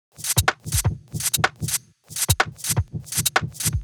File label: Drum Loop 125BPM; Unit Rcrds